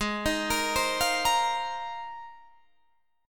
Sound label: Ab 6th Add 9th Ab, Guitar, Open D